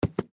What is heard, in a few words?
Foot Step; Welcome to the Game